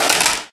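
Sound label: physics clipboard impact small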